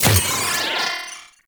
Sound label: Drone Death Malfunction